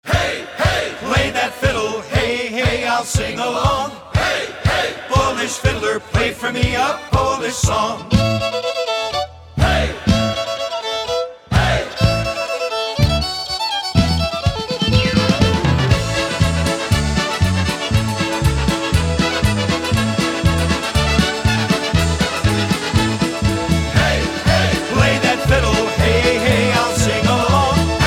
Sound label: Polka